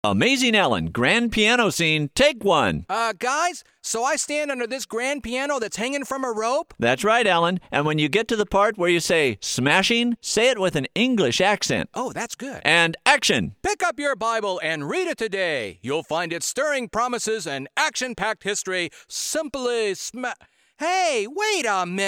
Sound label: Piano Scene